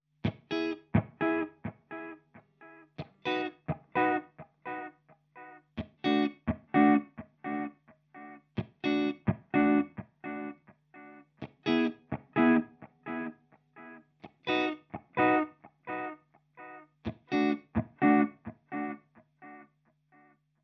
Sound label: Dub, echoplex delay; echoplex delay settings; Meris LVX